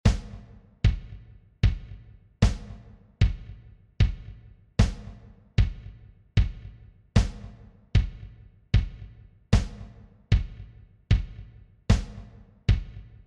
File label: Amazing Grace violas; Fiddlerman project